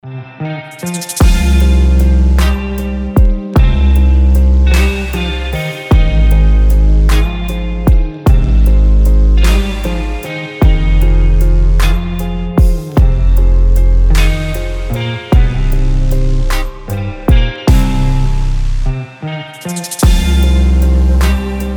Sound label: love blues